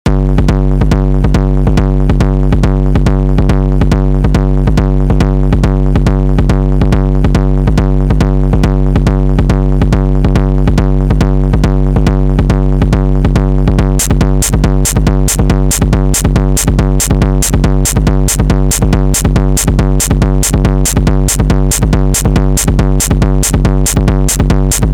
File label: Noise; Orbiter; demoscene; deadline harakiri; prerendered music